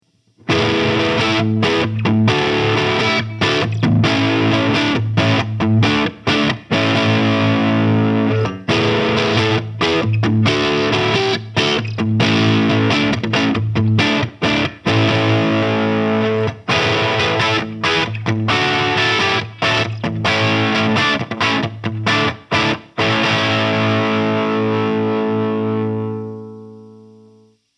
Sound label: Epiphone 1961 Les Paul Crunch